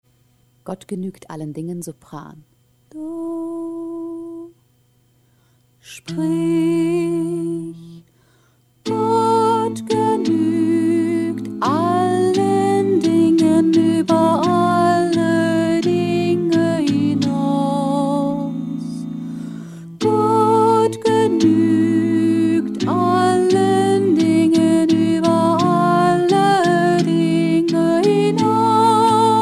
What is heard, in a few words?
soprano, choral music